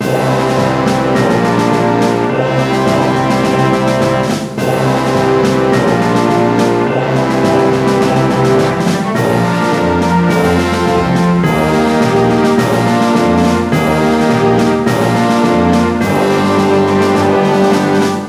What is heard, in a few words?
reveal music, Army Music